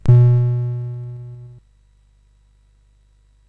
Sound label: Frantic, CSG8580R5